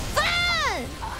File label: FUUU-